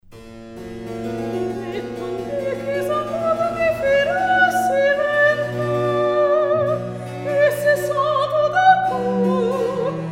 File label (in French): Recitatif; Forges des Lemnos